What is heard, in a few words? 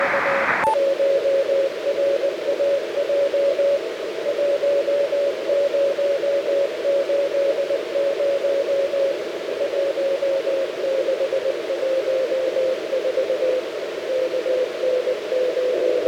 balloon CW 300Hz; OK1RAJ